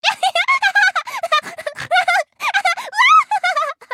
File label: laugh